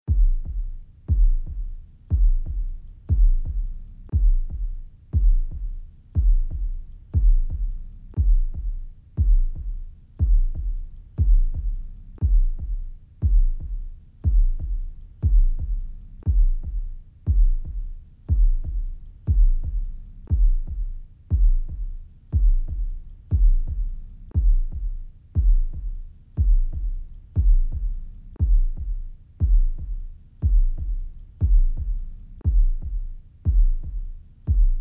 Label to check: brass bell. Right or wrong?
Wrong